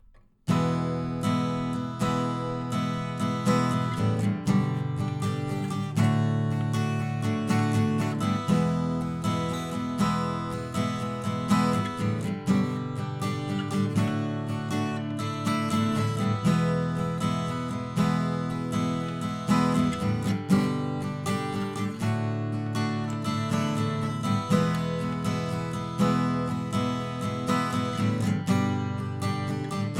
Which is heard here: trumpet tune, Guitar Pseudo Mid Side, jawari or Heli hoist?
Guitar Pseudo Mid Side